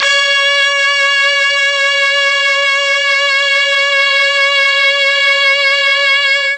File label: C#4 Brass, Mellotron MKII; Mike Pinder - Mellotron Archive CD